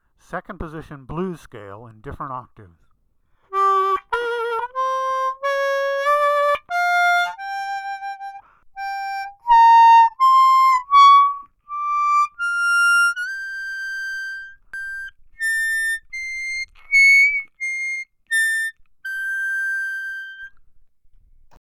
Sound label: Playing Both Ends